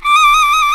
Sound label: KO-Q D4, KOKYU